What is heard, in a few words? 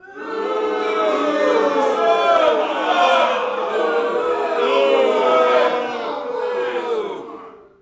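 boxing crowd small boo; SFX Kit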